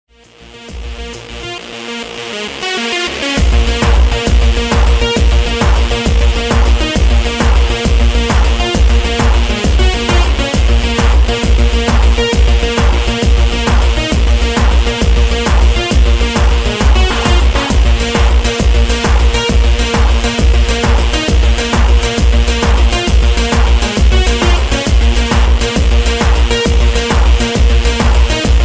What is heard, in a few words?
electro tone ringtone